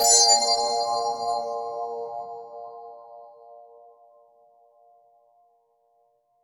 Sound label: narrow bright spike of